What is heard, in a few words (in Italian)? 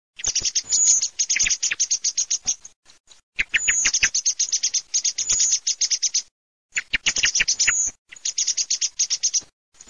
SCHIAMAZZO ZIRLO